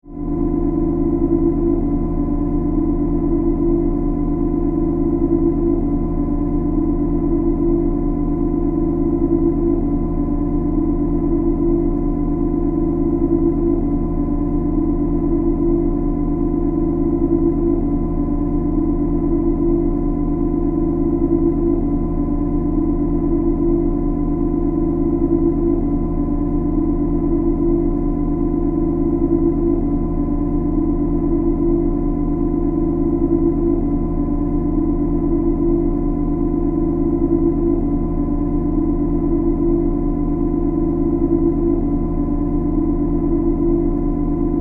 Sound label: Drone, Power, Source, Hum, Scifi; 5.1